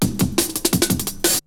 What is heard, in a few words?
BREAKBEATS, TECHNO